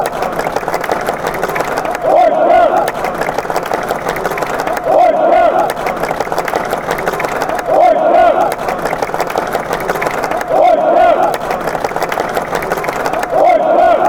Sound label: montenegro chant